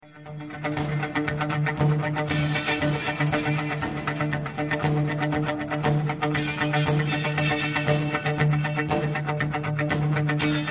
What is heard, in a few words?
Delay Rhythm Guitars; Mixed Up Front